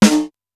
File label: SDS2000 SN; SDS2000 Factory6